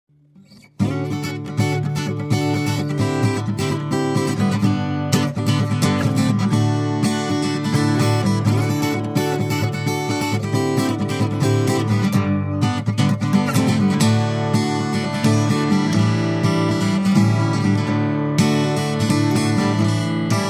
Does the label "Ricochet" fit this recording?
No